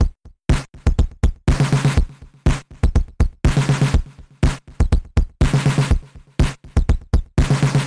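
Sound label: swinging percussion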